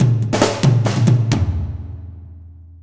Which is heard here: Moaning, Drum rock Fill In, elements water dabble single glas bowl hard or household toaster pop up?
Drum rock Fill In